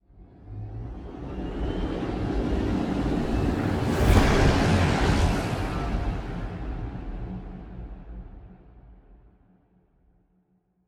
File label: ship cruiser move; Stellaris; machineage